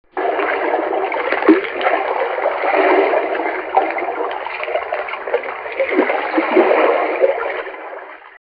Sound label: underwater, This is a sound that makes you feel like you are underwater.